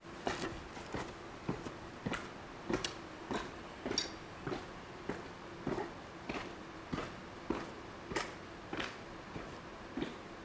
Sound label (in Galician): lavadeiro wolframio mina interior e pasos; mina valborraz casaio